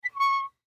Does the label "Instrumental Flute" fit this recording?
No